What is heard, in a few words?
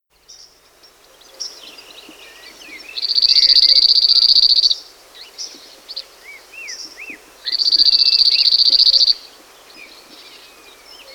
Cirl Bunting, birdsong